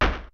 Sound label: kicks snare, kid; Kid Nepro; Elite; KICKS SNARES